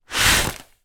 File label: foley plastic bag blow air into inflate